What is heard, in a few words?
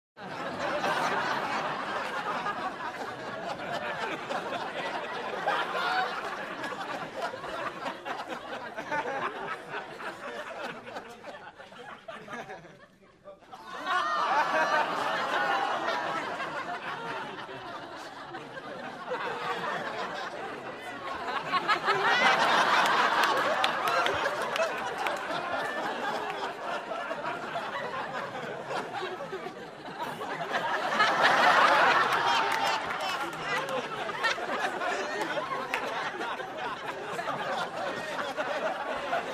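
Crowd 100 Hearty Steady, medium interior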